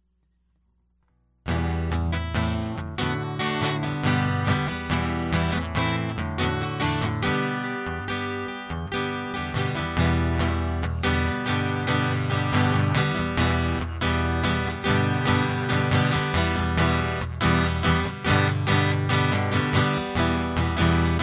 The Balance Dance Guitar

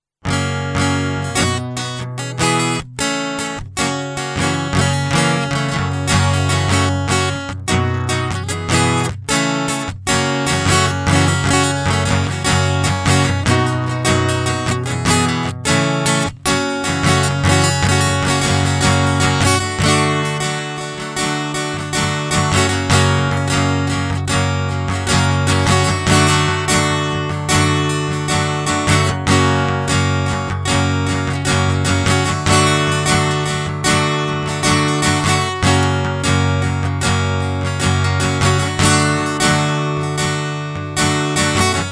Guitar Loop

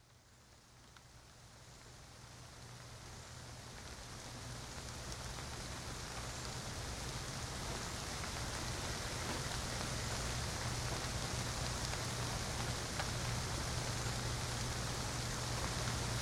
Rainstorm

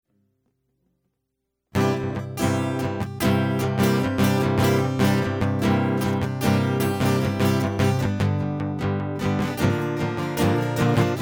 Instrumental; hyper terrestrial

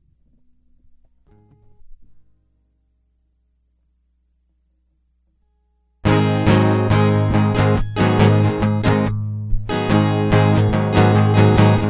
Guitar Loop

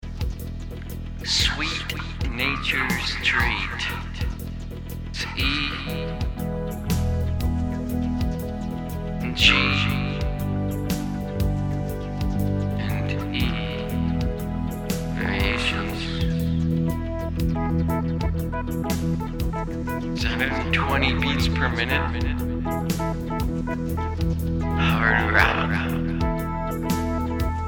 Soundcheck

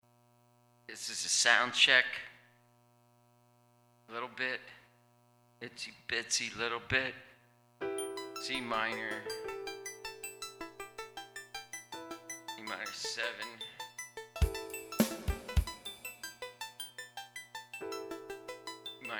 Soundcheck

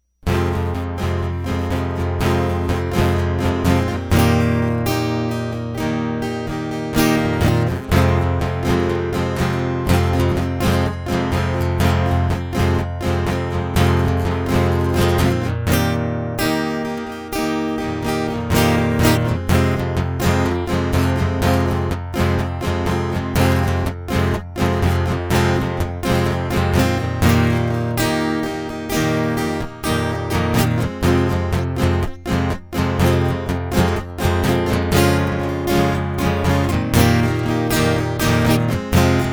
Guitar Loop

